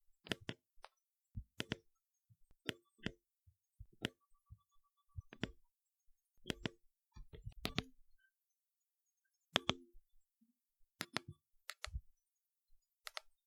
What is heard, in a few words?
Tv Remote